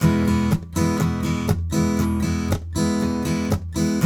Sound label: RIFF, Blues Guitar; ILIO; Fretworks